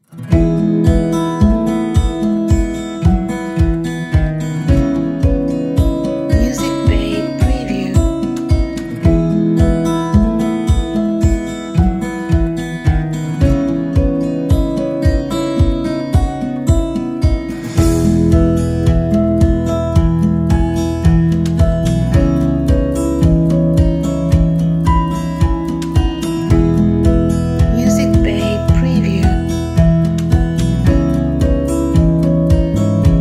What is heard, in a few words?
Calm Acoustic Inspirational